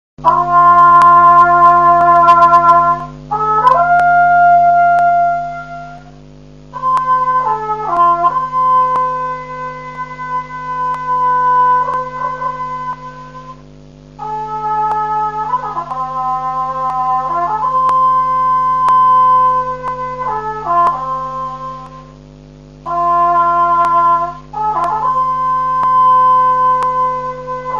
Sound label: hejnal